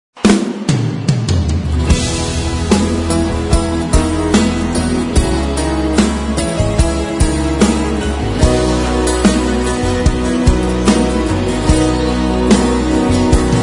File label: Acoustic